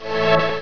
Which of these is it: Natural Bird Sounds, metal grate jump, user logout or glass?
user logout